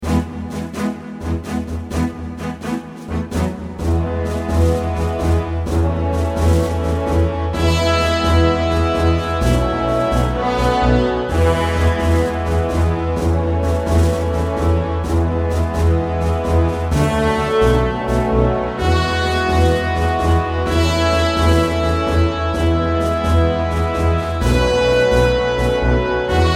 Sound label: fanfare